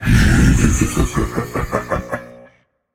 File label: laugh, voicepack; smite; jorm